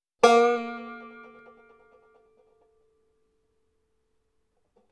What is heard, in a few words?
bending vibrato